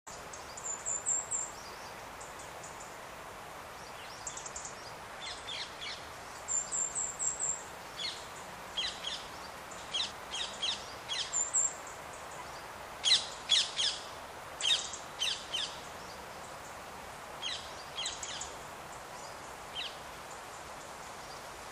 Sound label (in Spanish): Cotorra de Kramer